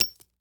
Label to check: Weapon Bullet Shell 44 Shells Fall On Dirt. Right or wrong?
Right